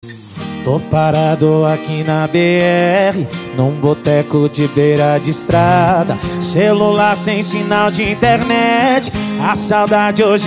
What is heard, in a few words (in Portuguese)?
Pisadinha